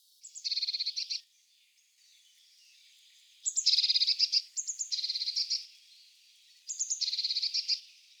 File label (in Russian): obyknovennaya lazorevka golos